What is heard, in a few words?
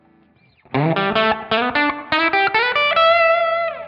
E blues, guitar